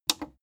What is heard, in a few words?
plastic button small simple click fast